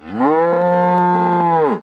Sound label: Cow; super trench attack 2